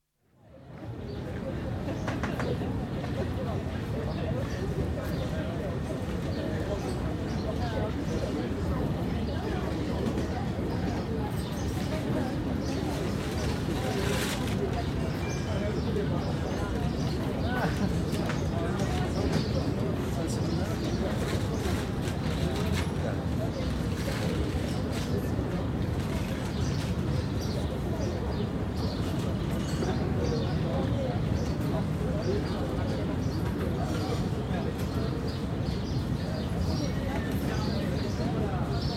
Crowds, Tuileries Gardens, atmosphere at open air cafe, with speech, children, passing footsteps, birds; distant; Restaurant; BBC